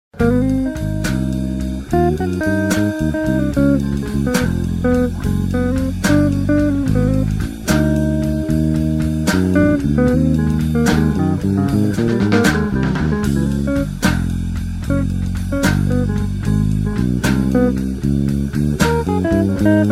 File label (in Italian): blues la bemolle